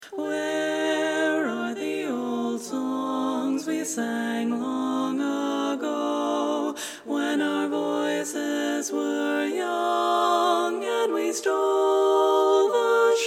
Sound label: Old Song Bari